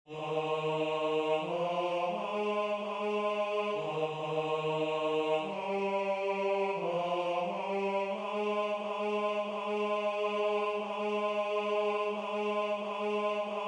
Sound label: Alma Mater BARITONE; West Point Alumni Glee Club; Rehearsal Recording